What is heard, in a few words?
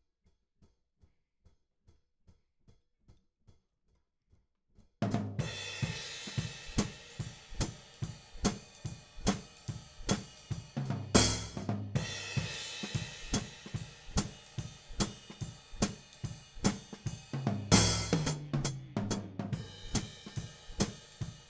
Hat